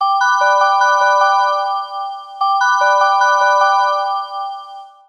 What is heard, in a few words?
train; Yokai Watch 2; TRAIN SEKKIN